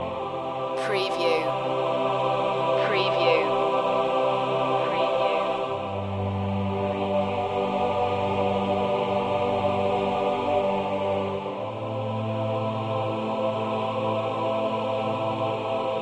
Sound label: blissful choir loop